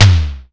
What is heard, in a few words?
SIMM HIT, Elec. Percussion; E-MU Producer Series Vol. 2; More Studio Essentials; Composer